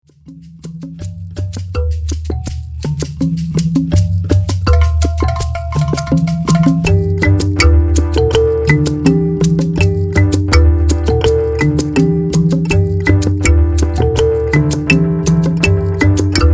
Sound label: afro kalimba